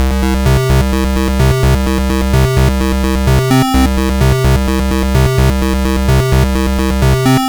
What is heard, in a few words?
Game Boy Synth